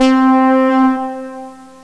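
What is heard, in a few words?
trumpet c4; StarTrek Demo; Star Trek Audio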